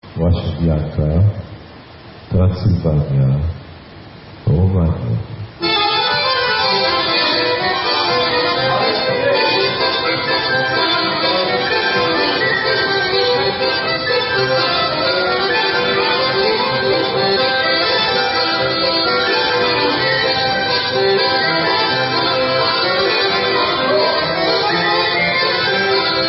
Rumanian Instrumental; Brasoveanca

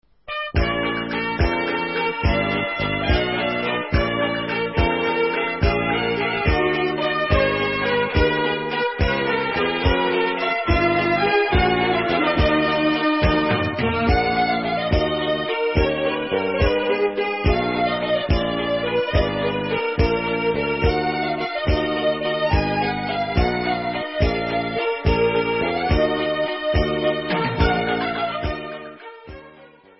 Vals, Instrumental

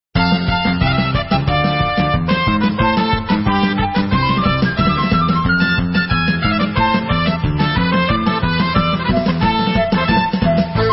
Hora Instrumental

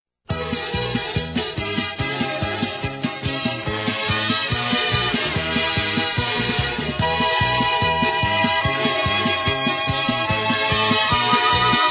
Instrumental

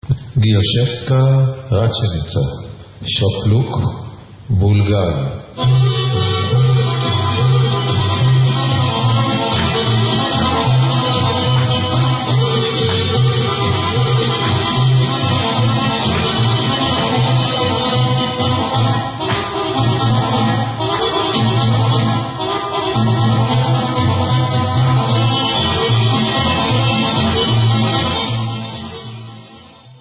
Gjuesevka Racenica Bulgarian Instrumental

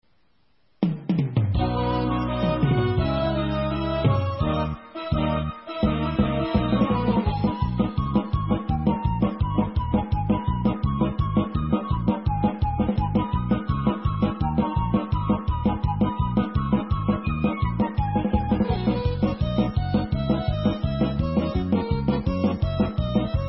Hora, Instrumental

